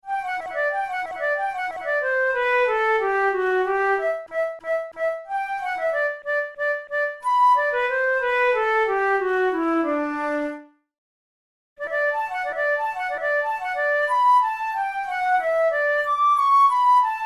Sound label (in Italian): capricci per flauto traverso; ruge